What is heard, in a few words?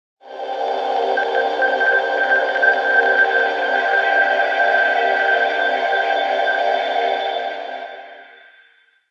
atmosphere; Nokia; 8800